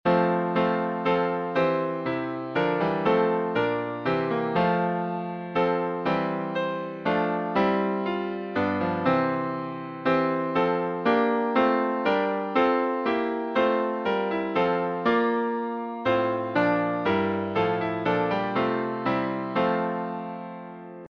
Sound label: Hymns